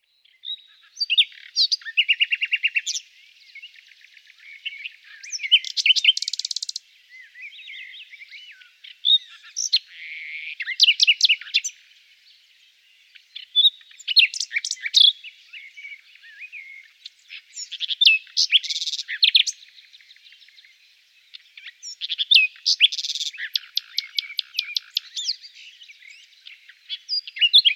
En un bosque de montana

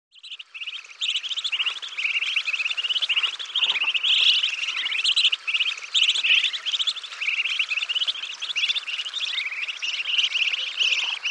Los pequeños patos

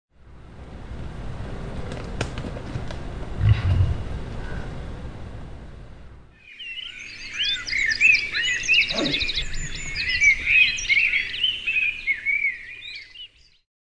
Sus scrofa - Jabali

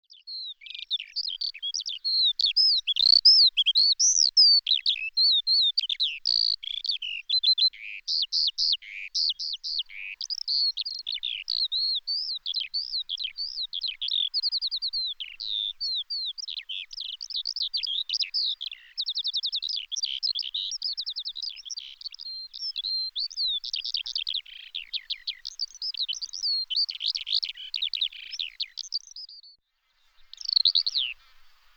Alosa Vulgar

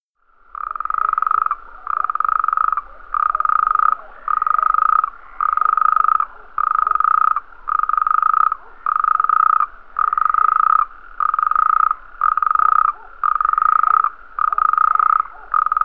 Sapo Corredor Bufo Calamita

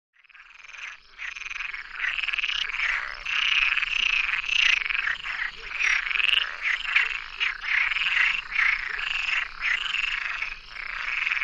RANA COMUN

